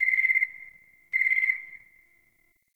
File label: Night Zone Tree Critters; Warframe; Eidolon Plains; Ambience